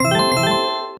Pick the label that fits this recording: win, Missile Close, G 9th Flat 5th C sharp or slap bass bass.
win